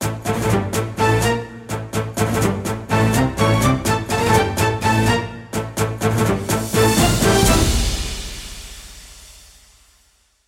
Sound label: Music Game Action